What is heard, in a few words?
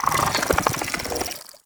Infested Fishing Fish Caught; Warframe; Infested Fishing Spear